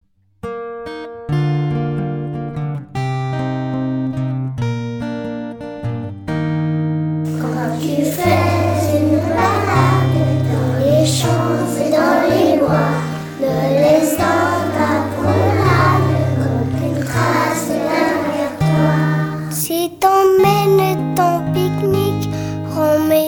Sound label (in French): chanson pour bien respirer